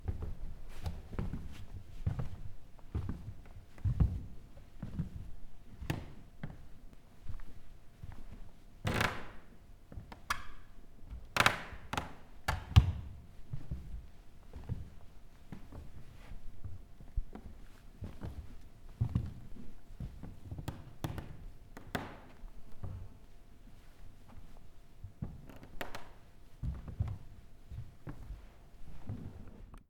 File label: Steps On Wooden Floor